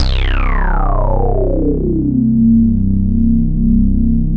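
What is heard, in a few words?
WOW, right; East West - Phat & Phunky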